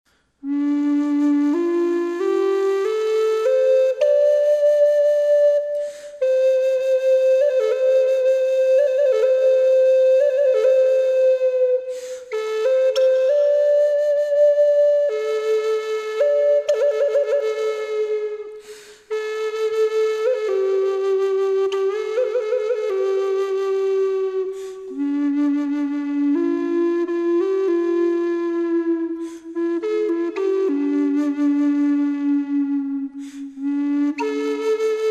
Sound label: Maple Burl Classic